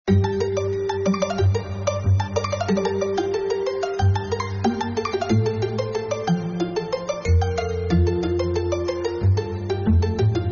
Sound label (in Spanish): Divertido Fresco Bonito Brillante Fantasia